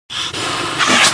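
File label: snake attack; WeakAuras; PowerAurasMedia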